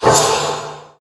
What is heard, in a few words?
vodoo kamikaze lanza